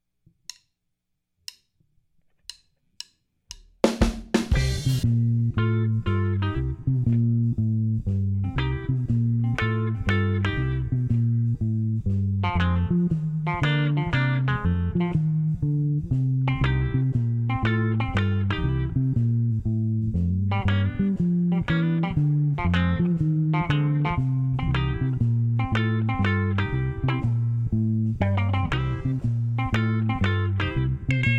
Texas Style Blues play along

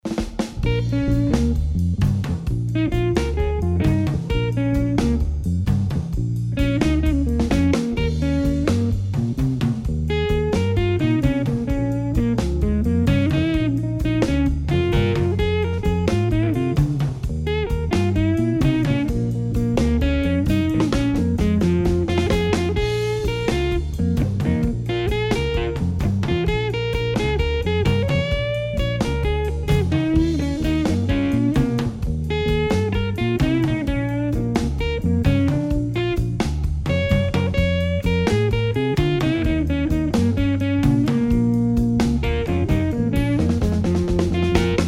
Rhumba Blues Drumming

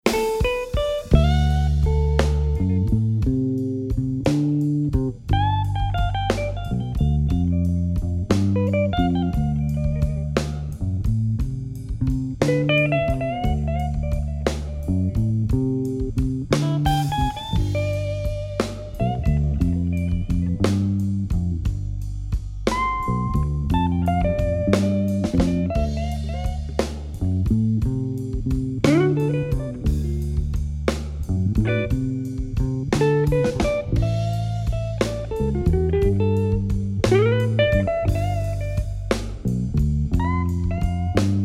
Style Slow Blues Drumming

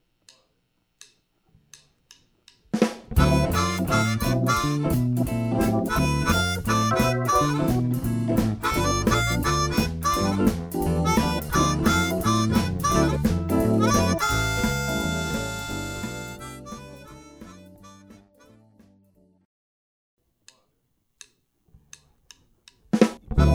Blues Swing Backing Tracks Drummers

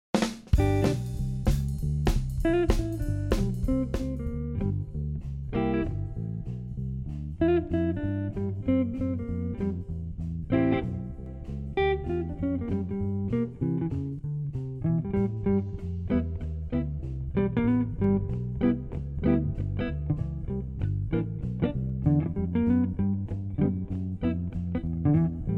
Jazzy Swing Blues play along

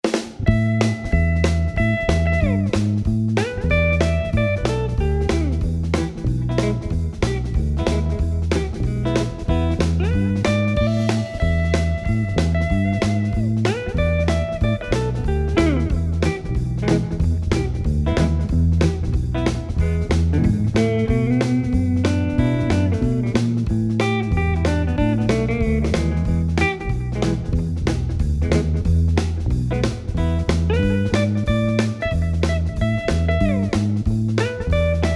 Train Beat Blues Drumming

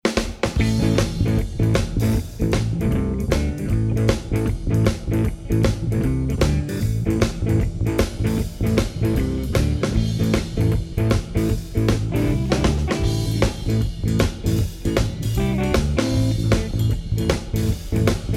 Boogie Blues Drumming

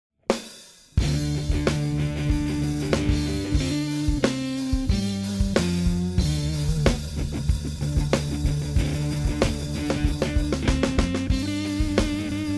Rock Style Blues Drumming

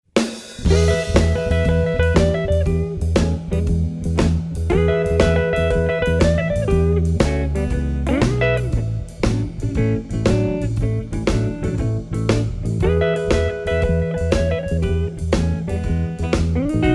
Chicago Blues Shuffle Drumming

